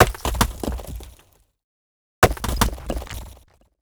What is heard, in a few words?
Resynth Stone Break